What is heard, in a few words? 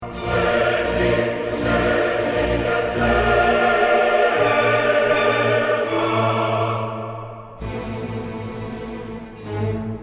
Audition Accompaniment